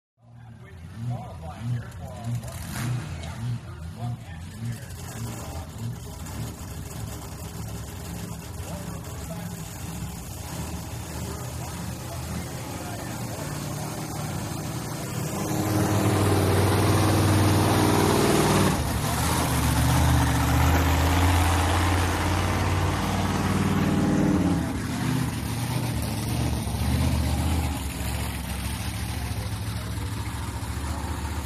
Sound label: Monster Trucks; Racing Ambience, Pa, Crowds, Monster Trucks Idling, Revving, Waiting To Race Thru The Obstacle Course; Shot Cu From The Obstacle Course.